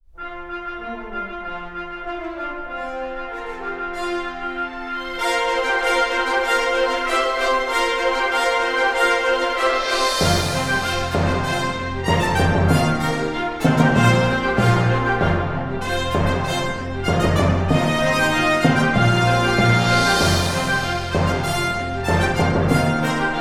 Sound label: Fanfare